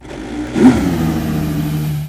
startup; AOS F1 2022

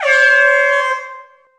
startlight green GO

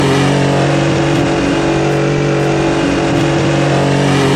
in Pmed, F1; AOS F1 2022

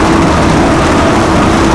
grass skid wet, Tires; Kart; AOS RF1 Kart Demo

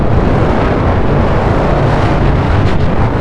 wind rush in

